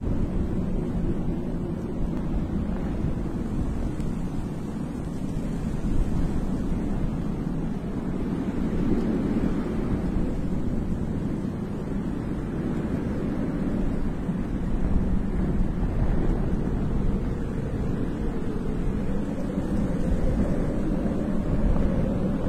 Soothing Frosty Morning, Rain Soundscapes, White Noise Sounds